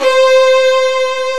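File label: ARCO violin, Solo String; E-MU Producer Series Vol. 2; More Studio Essentials; Composer; Solo String Combo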